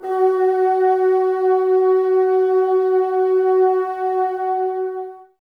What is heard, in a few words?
Bassoons F#4; Miroslav Vitous - Woodwind Brass Ensemble